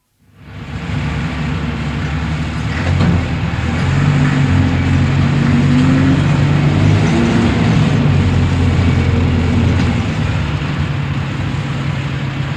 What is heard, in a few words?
Interior,stopping (2 ton diesel truck, make not known); Truck Sound Effects; BBC